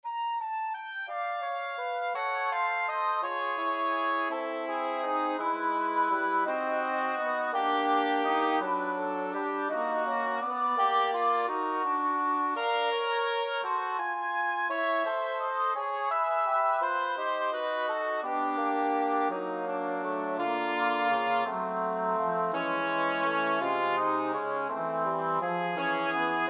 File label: gentle ringtone